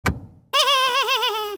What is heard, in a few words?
Turnoff; Troll Face Quest Unlucky; Android